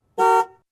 Car Horn once

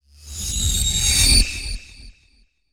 Iron shriek reverb

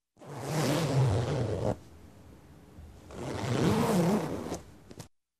UFO zooming back and forth

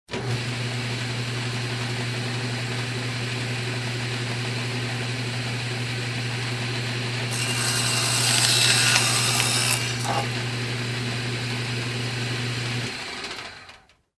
Roof Machine Cutter

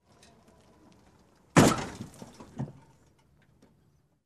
Car Bump Sound

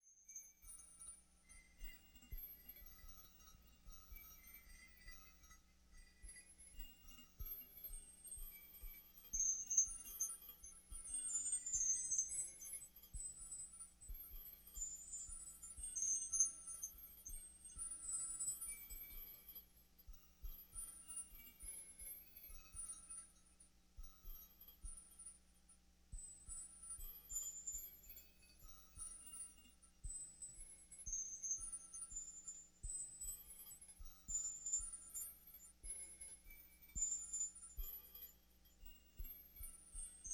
Twinkle sound in hall

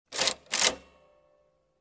Button press

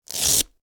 Velcro strapping

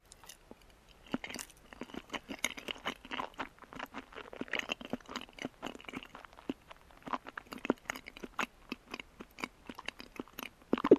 Hard Chew and Gulp

